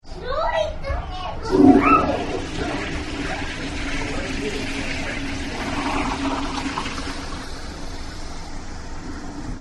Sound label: flush; nbc; usa